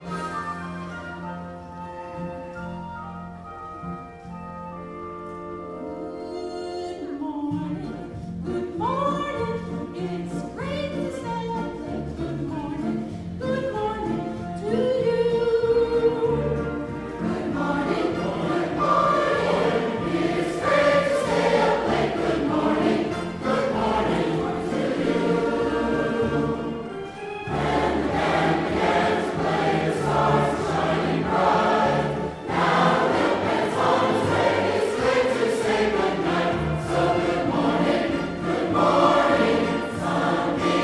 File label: Medley, choral